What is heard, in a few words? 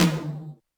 Yamaha RM 50 Toms